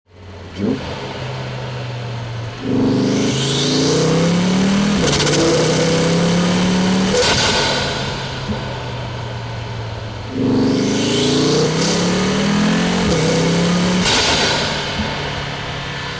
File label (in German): Fahrt, 2.5 TFSI EVO Open Air Intake; Ansaugung